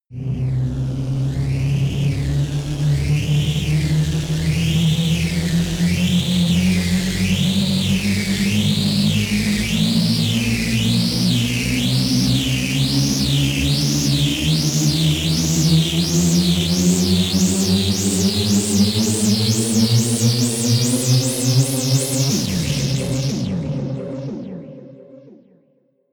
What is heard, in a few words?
detuned synth orchestra distorted